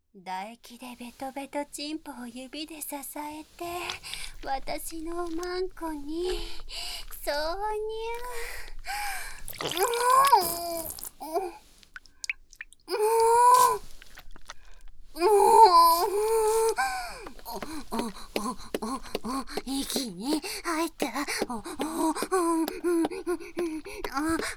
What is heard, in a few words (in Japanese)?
おほ声騎乗位 効果音入り; シスターが祓う穢れ